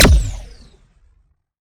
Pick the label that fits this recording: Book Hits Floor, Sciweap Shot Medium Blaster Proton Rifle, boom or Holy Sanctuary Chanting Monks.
Sciweap Shot Medium Blaster Proton Rifle